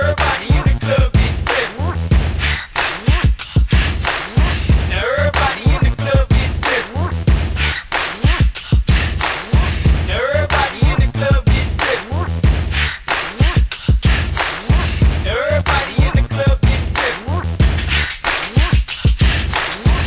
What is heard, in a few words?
hiphop